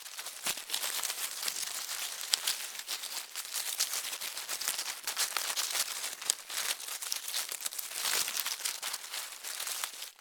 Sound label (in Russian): krot